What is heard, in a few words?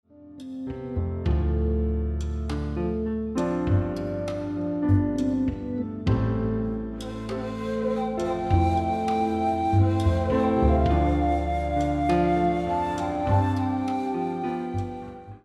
Accompaniment